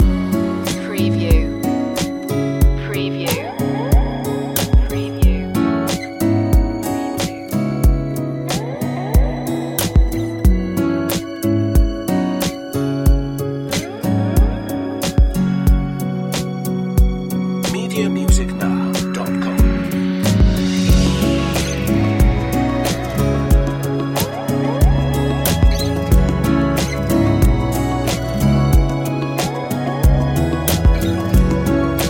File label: chill acoustic flash loop